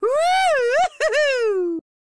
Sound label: Man Woohoo; Zomboid; Lifestyle